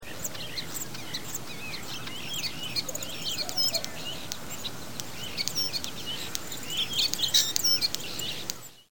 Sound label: European Starling